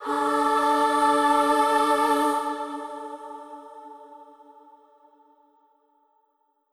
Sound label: Loop End